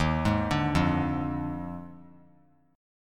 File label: D sharp Major 7th Suspended 2nd Suspended 4th; arpeggiated; JGuitar